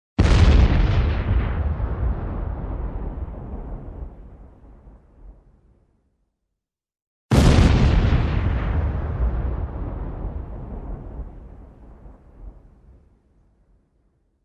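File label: Two excellent explosions close up; Звук взрыва от бомбы или снаряда; Dva otlichnyh vztyva krupno; Атомный взрыв, взрыв с огнем и горением обломков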